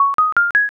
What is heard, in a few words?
score up